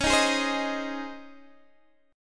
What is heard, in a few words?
C sharp Major 7th Suspended 2nd, Guitar, Open D